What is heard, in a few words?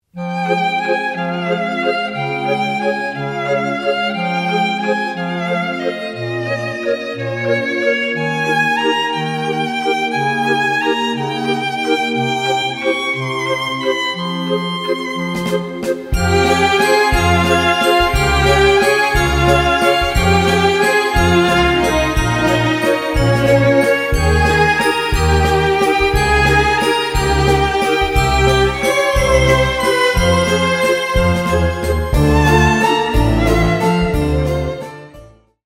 instrumental